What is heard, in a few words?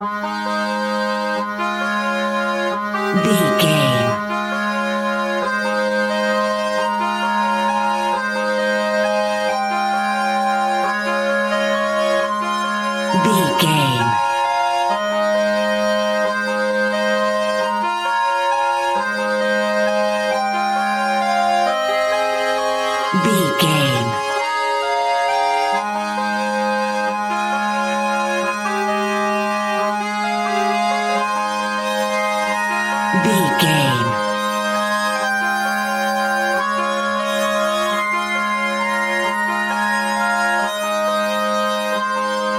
accordian, Squeeze Box; Rock A Bye Baby Squeeze Box